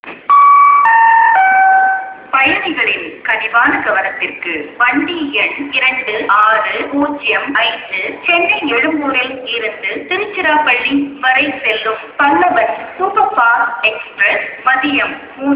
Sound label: Railway